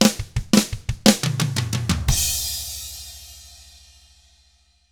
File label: 116 SHUFFLED, Drums; ILIO; Double Platinum Drums 1